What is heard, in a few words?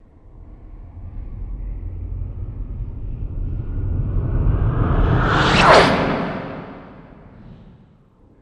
Starship By Whoosh